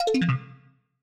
stream ended

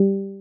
stream user left; backspace

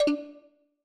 user leave

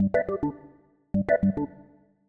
call calling

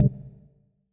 deafen; backspace

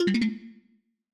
stream user left; backspace

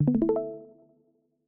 stream started; backspace

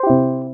stream ended

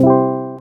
stream started

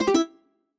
camera off; backspace

